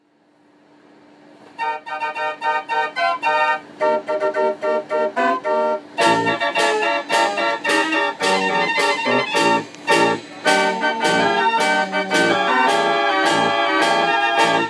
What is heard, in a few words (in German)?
Soundscape Gartenbuehne